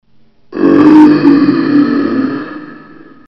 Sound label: zombie; dod